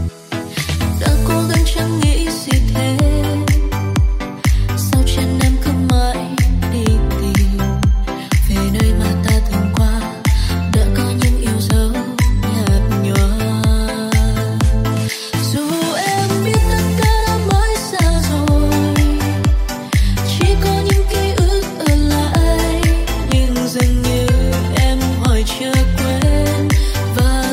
Nhac chuong remix giong nu; tiktok

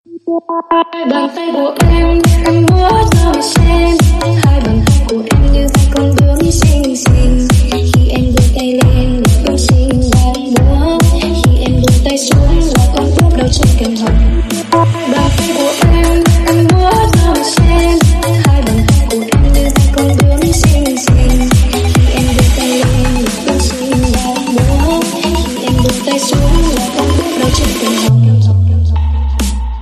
Nhac chuong remix; TikTok

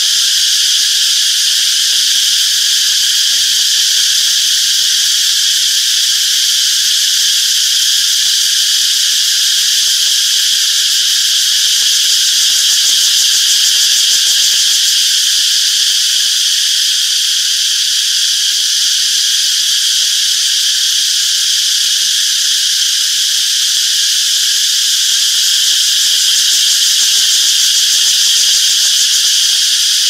Tieng ve keu

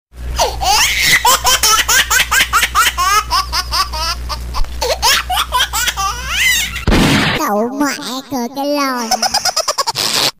Nhac chuong Tieng cuoi ba dao

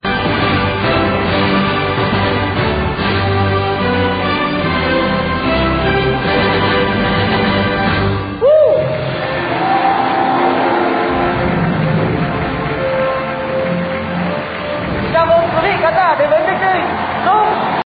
tieng chuong; chuong trinh rung chuong vang

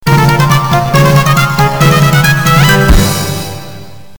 Nhac chuyen chuong trinh

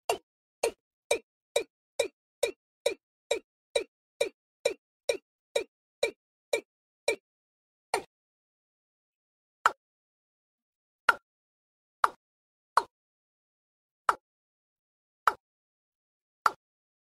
Tieng Ga nuoc keu

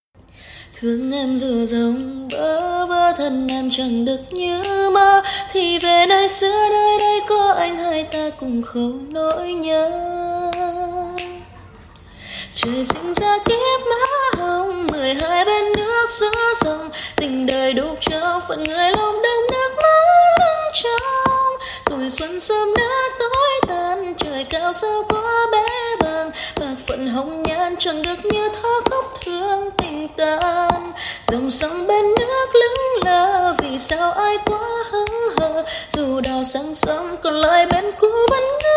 nhac chuong giong nu cover

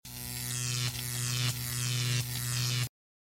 Tieng dien giat xet

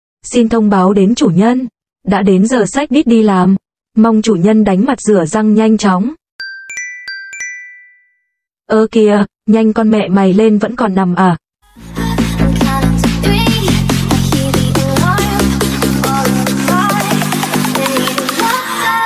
nhac chuong bao thuc day di lam cuc doc; tiktok

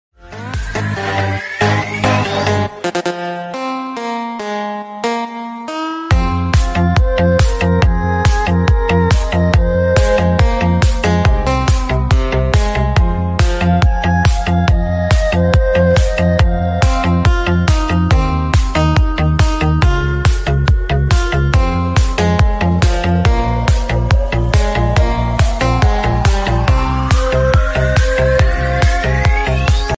nhac chuong bolero remix khong loi; tiktok

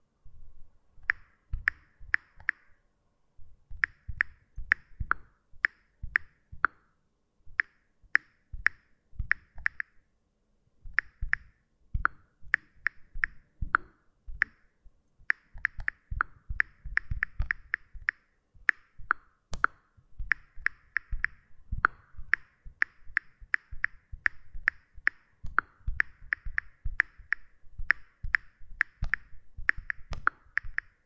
Tieng bam phim dien thoai iphone

